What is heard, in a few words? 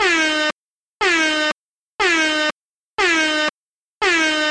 5 air horn blasts